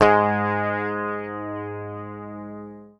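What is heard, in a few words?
bass, G#2, left channel; PICK; Sampleheads; Will Lee Bass Library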